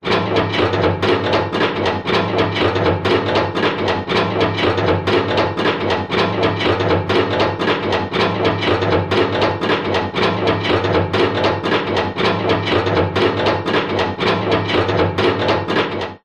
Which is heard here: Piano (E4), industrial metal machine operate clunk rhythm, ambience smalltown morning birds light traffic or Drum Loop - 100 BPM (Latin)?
industrial metal machine operate clunk rhythm